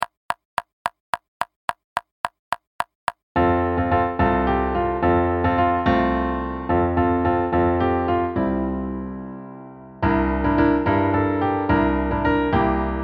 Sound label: Snare Accompaniment; snare drum solos